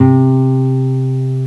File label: acoustic guitar, string instruments; Sound Effects for Multimedia - Volume 1